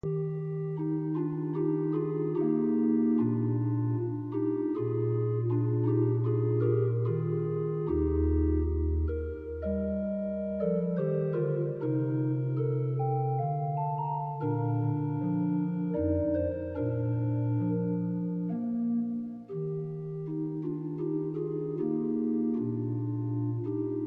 Andantino Es Dur; Mozart

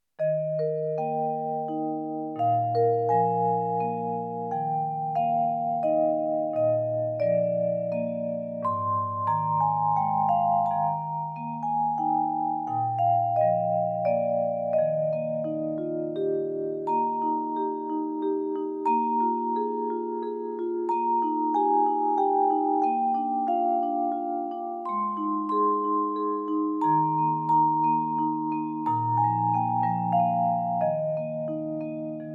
Dona nobis pacem Es-Dur

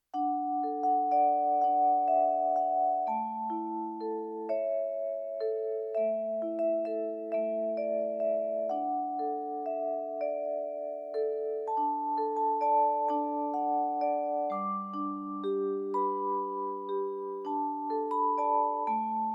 Glocken

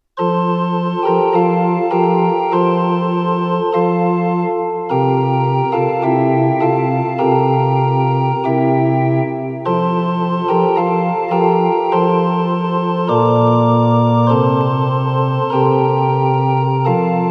Kyrie F-Dur 3x

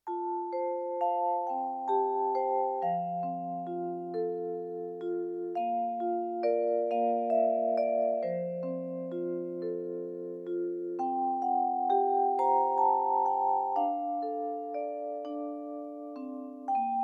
Leise rieselt der Schnee

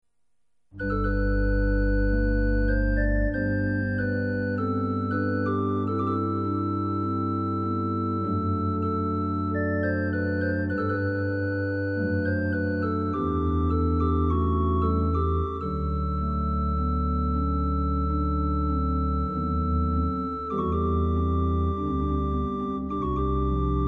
Liebster Jesu; BWV 731

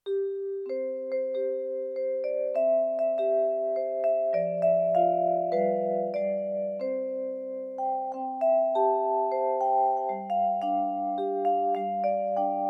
O Tannebaum

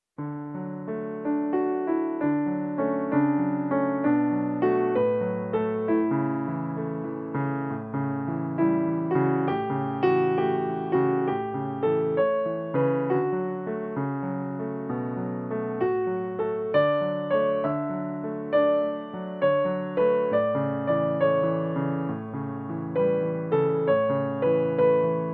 ostseelied

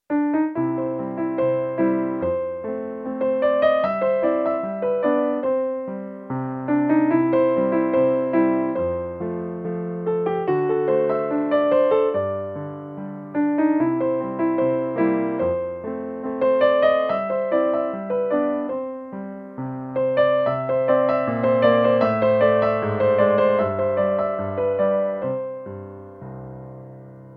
entertainer anfang

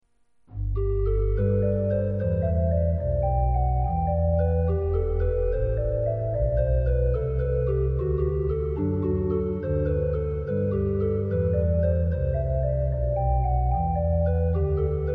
Bach Jesu bleibet meine Freude; BWV 147

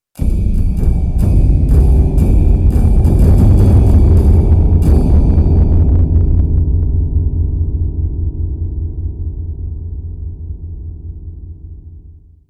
rumpeln

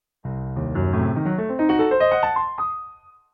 Kadenz d moll; Mozart

